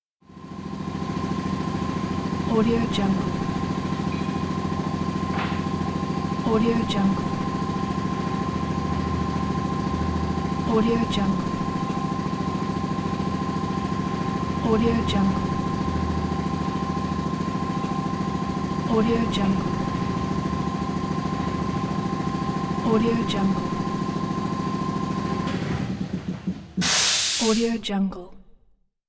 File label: air compressor